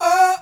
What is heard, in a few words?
Vox